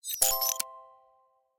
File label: code token pickup; Ben 10 - Omnicode